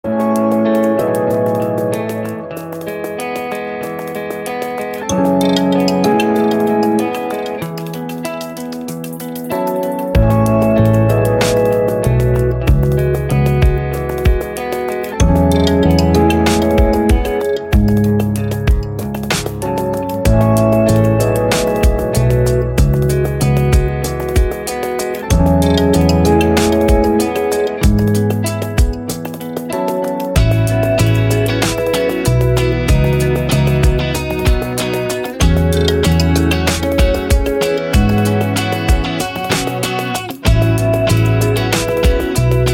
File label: Deep Swim Electronic